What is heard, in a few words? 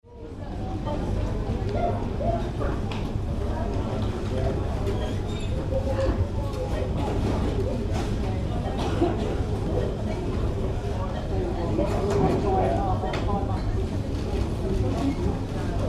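Supermarket Atmosphere - Large supermarket general atmos; chatter - some till noises; Stores And Mall; Tom Hutchings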